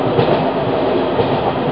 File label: Run, Train; OpenBVE